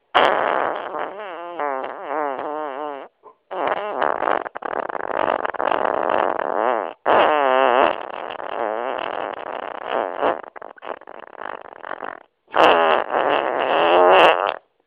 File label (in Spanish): Extralargo, pedo